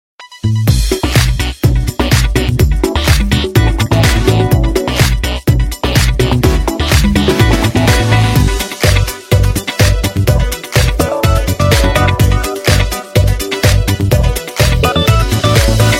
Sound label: nokia violet